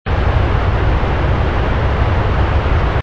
water rumble; gruff; heavy metal f.a.k.k. 2

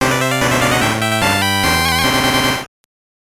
Level Clear

